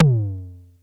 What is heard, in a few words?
JX TOM; Crypton - DrumBase5000